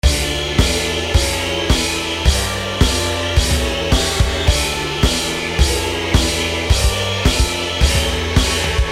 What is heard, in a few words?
chorus; TheBalladofJack; making boss music the ballad of jack devlog